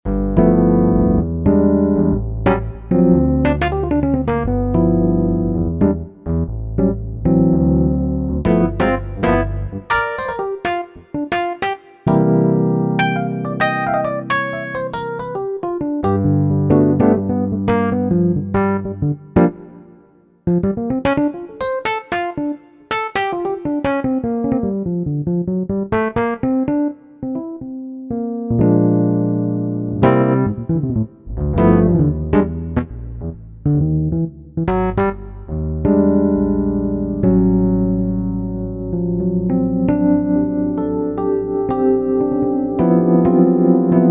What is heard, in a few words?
Session E.P.